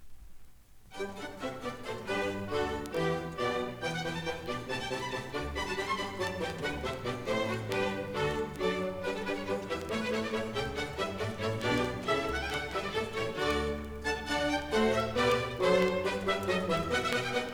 Water Music; Handel

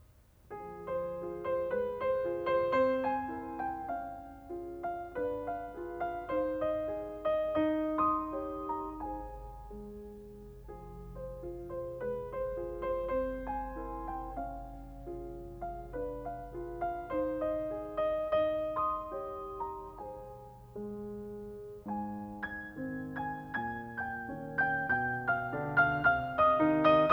Carnaval for piano; Schumann; Op9; Rubinstein

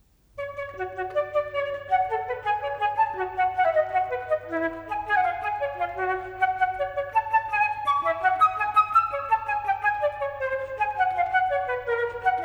Solo Flute; Telemann; TWV40-11

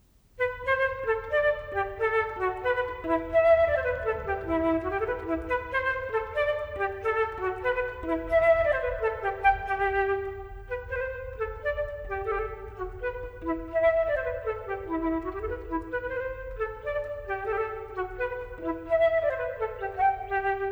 Solo Flute; Telemann; TWV40-9

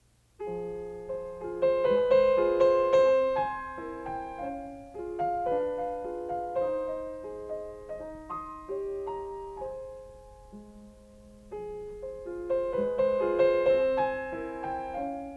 Carnaval for piano; Schumann; Op9

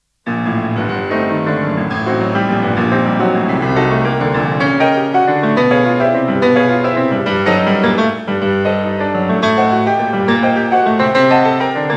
Preludes; Chopin; Op28; Lympany

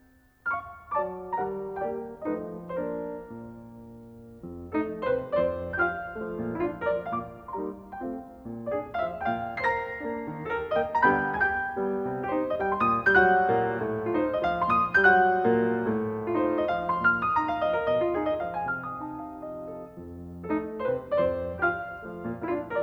Carnaval for piano; Schumann; Op9; Rubinstein

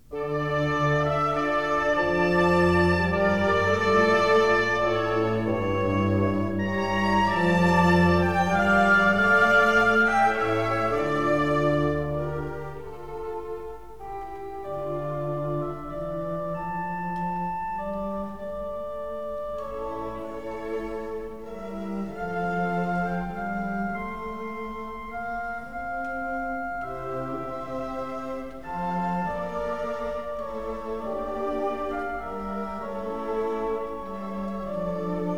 Handel Organ Concerto No5 Op4-5

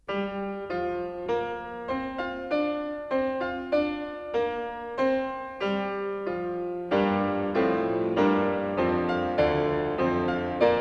Pictures at an Exhibition for piano Promenade; Mussorgsky; Graffman

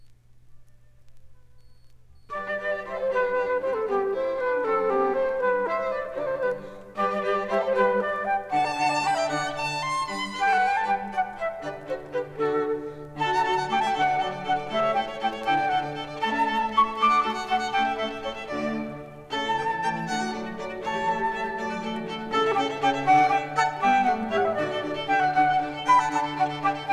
Haydn Flute Quartet Op5 No2; Wanausek

